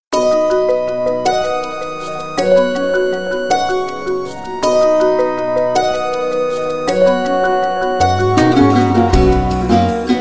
Indian music

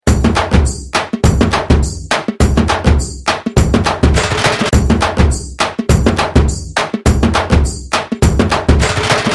A Zippy Tone; Indian

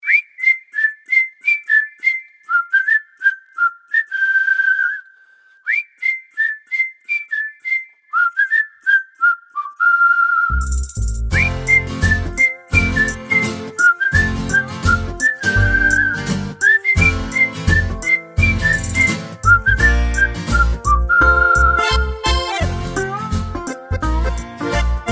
whistle music; Veera; Indian

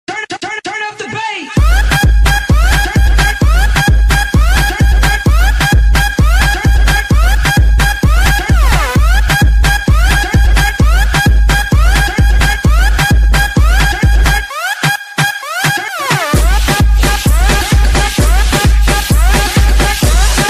electro house; Dance; Electro House 2017

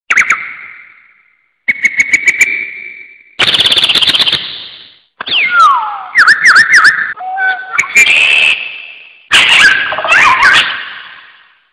bird new; Animals

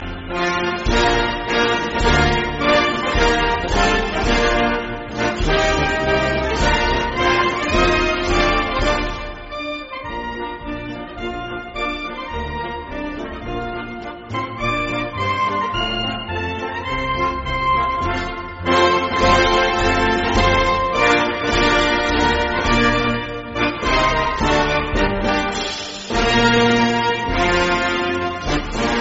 royal navy march sound; Country

